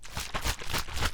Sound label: soda shake; civ13; civ14; Items